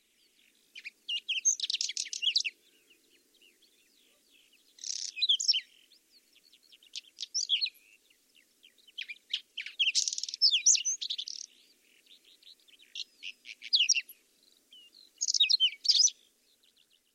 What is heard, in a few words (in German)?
Braunkehlchen